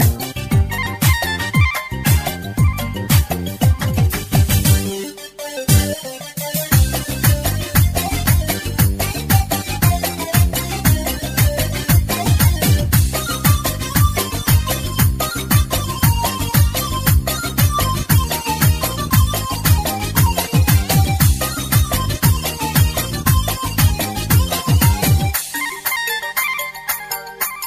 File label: Bandari